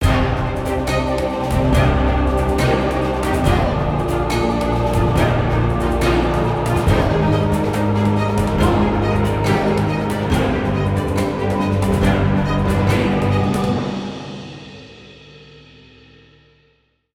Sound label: Battle Drums